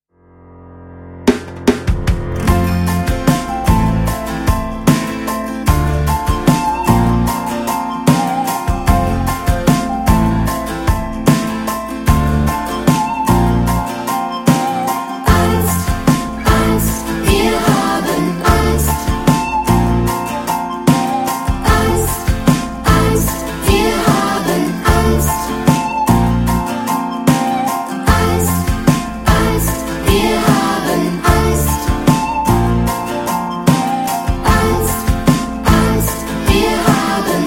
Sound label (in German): Rap